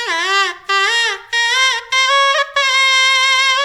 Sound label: World Lick